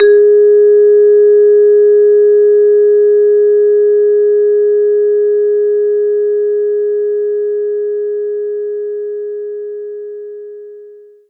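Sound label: vibes G sharp 4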